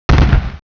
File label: explosion